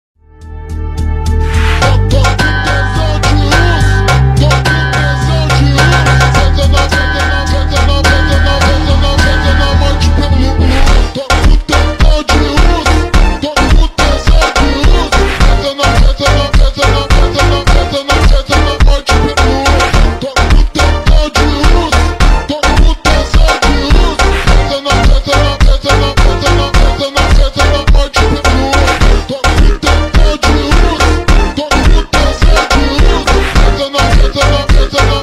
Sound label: funk slowed